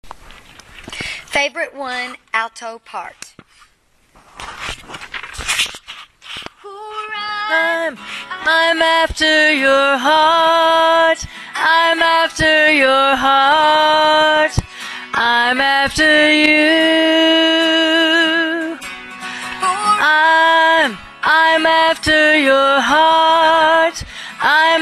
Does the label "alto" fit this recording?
Yes